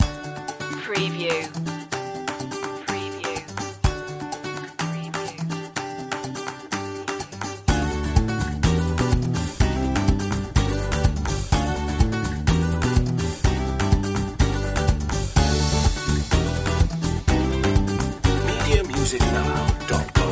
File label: bright journey loop